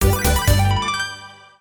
win; Oktoberfest

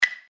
reelspin click; Princess Wencheng

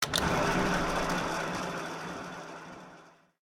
spin; Hot Fruits 100; slot game

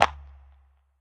spin start; Hungry Shark; Wazdan

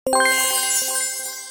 expand; Grand Casanova

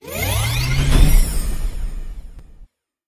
wheel shock wave; Hot Spin Deluxe

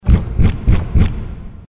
bird walk; trex; The King Of Dinosaurs

